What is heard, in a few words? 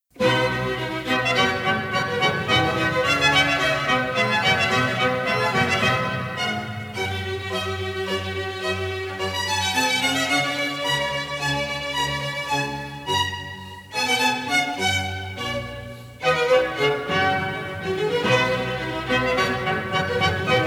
Sonata em C maior con 2 clarini-2 violin; Trumpet Sonatas; Antonio Caldara; Edward H Tarr